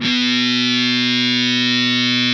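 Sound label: fuzz, fuzz guitar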